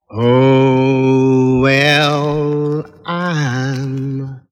oh well i'm SUNG

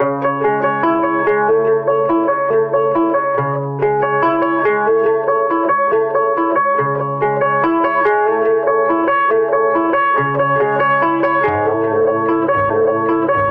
guitar

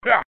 ha, ooh ahh

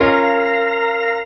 droplets; Notes

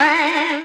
eerie, Voice; Notes

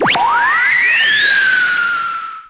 space noise, zaps and beeps

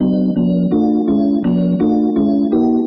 uh oh, loops electronic

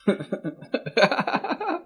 laugh

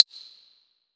ringy, Misc Percussion Clavas

